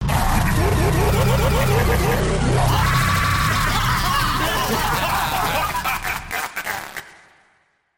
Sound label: round victory; missingteam